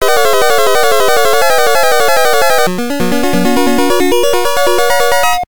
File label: Game Start; Ghosts 'N Goblins; NES